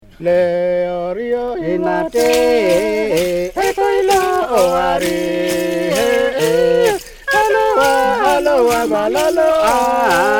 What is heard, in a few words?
polyphonies